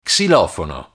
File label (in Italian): xilofono